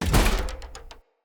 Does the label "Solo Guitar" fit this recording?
No